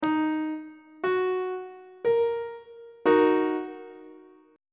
e flat minor chord; on treble clef

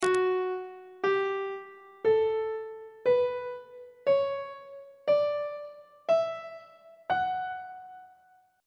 f sharp phrygian mode on treble clef

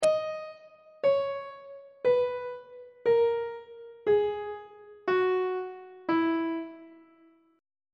e lydian mode descending on treble clef

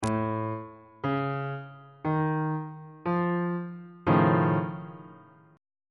f augmented 7th 1st inversion on bass clef

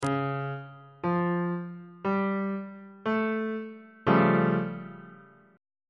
a augmented 7th 1st inversion on bass clef

